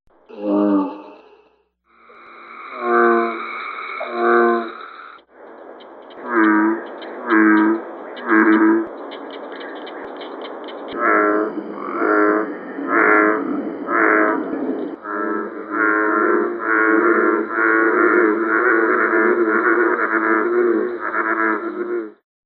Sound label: Bullfrog call